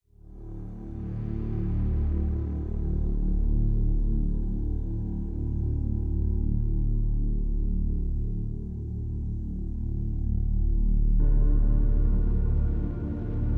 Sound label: Lift Off Drone Light